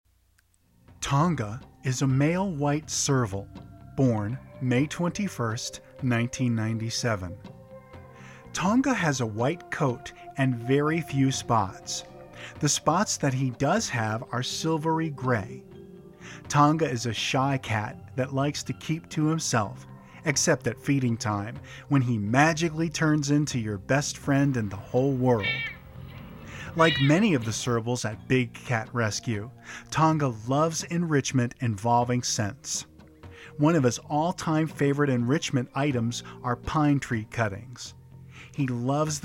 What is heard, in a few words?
Serval; Tonga